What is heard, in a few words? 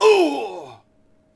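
Longbowman Die Vox; Civ4 warlords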